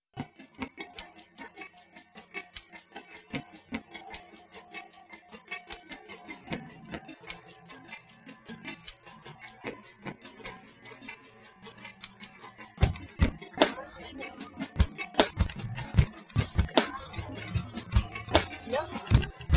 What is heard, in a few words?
percussive